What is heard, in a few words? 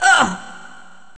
fall; alienarena; femforcer